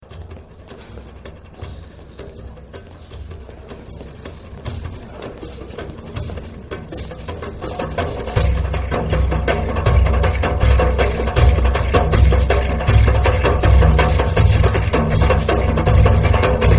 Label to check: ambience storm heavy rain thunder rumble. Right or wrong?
Wrong